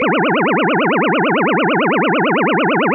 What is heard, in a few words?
laser beam, space